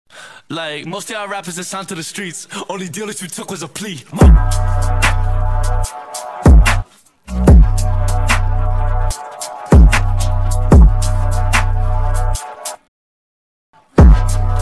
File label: Instrumental